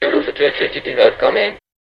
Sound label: jingle